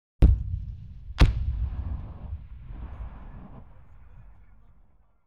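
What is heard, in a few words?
Warfare, 84mm Medium Anti-Tank Weapon; BBC Sound Effects Library; Explosions Guns Alarms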